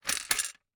Gumball machine, metal, glass, candy, switch, mechanism, rotate, dropping, jammed, wide; Eiravaein Works; Vaeyan IV